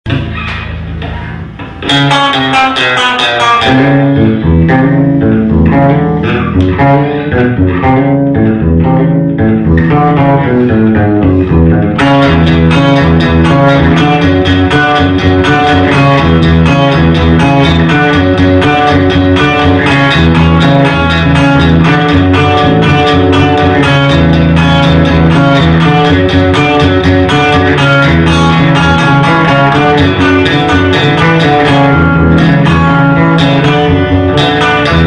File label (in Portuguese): baixo bass violeira